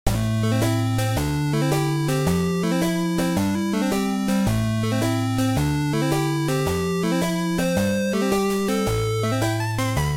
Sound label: windy woods